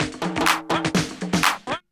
124bpm, House Perc Loops

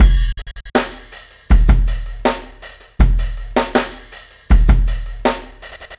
Marching Kit 4-Bar, Breakbeats; Drum n Bass; Beats and Percussion; KJ Sawka; Drum n Bass & Beyond

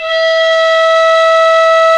FLT PICOL, Flutes, Picolo& Flt

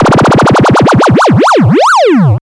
pinger

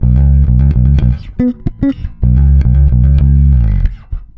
NASTY C#, bass; Spectrasonics; Bass Legends

